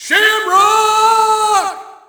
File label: Shout, audience; UFC Tapout 2